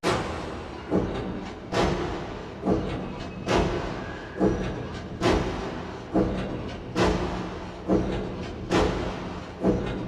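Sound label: Ambient Hell Loop; silent hill homecoming 2008 gamerip pc ps3 x360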